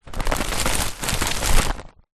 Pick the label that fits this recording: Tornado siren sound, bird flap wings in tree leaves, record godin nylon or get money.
bird flap wings in tree leaves